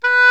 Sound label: Oboe f; Roland L-CDX-03 Disk 1; Oboe 4-8